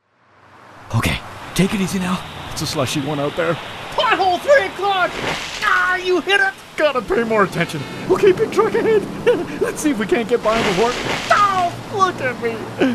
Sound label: splash